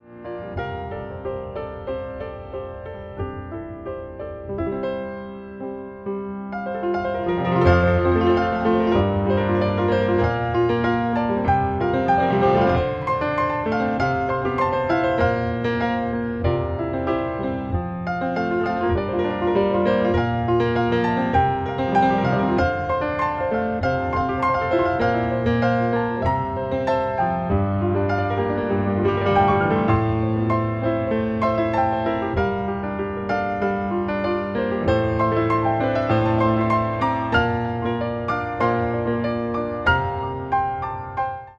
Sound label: Piano